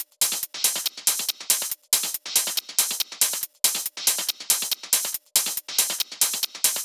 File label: Top Loop